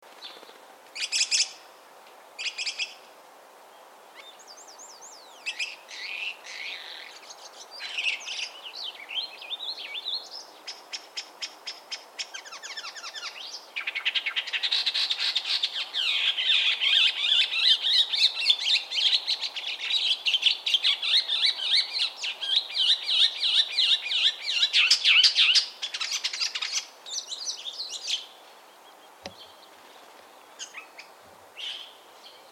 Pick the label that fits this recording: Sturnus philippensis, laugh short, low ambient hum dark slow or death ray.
Sturnus philippensis